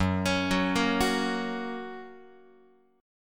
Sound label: F sharp Major, Guitar; arpeggiated; JGuitar